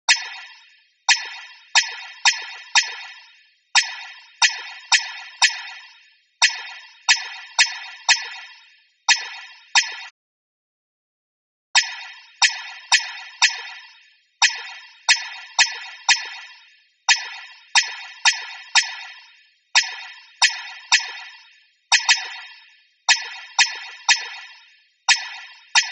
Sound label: snares